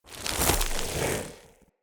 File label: Corruption Wave End; Enemy; Lady Deora; Abilities